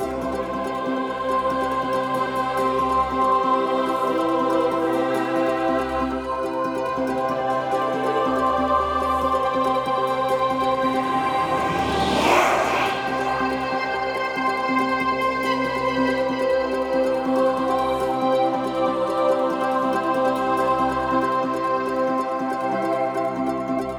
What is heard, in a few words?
Player Victory